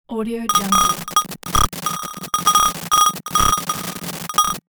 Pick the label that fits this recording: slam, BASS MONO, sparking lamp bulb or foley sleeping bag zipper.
sparking lamp bulb